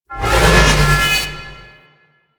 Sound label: Charge Ability Charge Up; Enemies; Abilities; Warframe; Duviri; Duviri Dax; Dax Knight